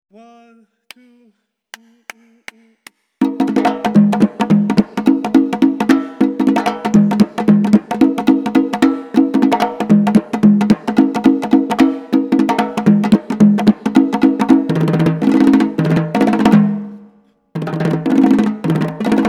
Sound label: Mambo - Percussion Break